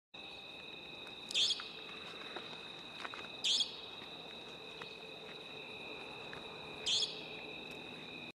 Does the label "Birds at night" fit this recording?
Yes